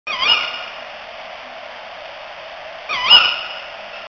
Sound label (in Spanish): canto Strix aluco Hembra, rapaces nocturnas